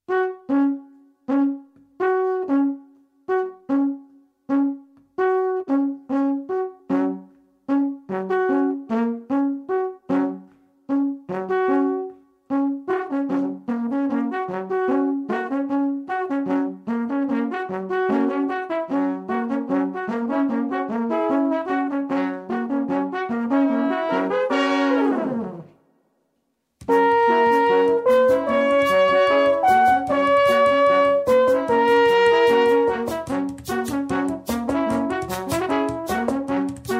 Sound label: alphorn